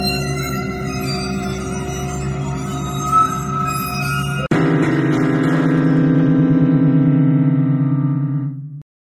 death

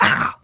rasping sound

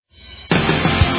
white noise; stinger ident

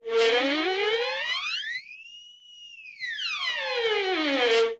violin slide up down

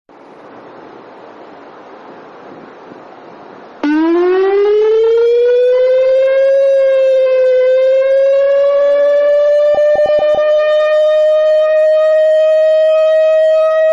electronic siren

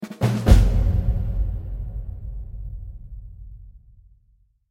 update quest sound; skyrim

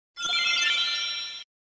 sound effects new game; final fantasy viii

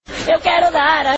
ringtone

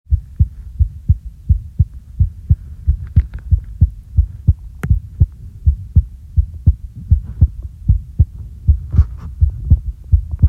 heartbeat normal a little fast

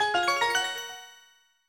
F flat major magic

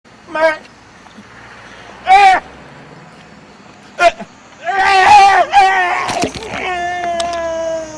wheelchair

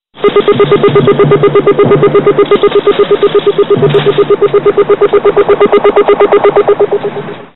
bionic eye sound

